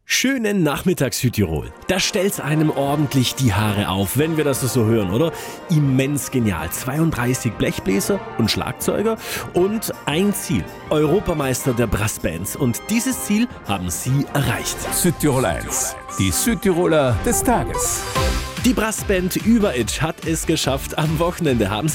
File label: Brass Band; Südtirol; Hans Finatzer